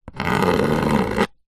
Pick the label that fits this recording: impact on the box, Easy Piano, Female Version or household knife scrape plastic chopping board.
household knife scrape plastic chopping board